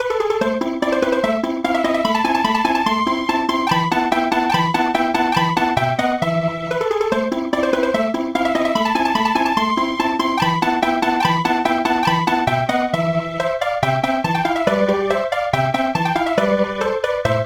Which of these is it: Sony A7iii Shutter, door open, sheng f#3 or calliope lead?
calliope lead